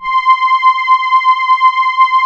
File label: Poly800 C4, Korg; Back In Time Records; Korg Universe VOL-1